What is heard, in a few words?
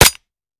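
weapon fire last player mech, 1911; weapons; gmod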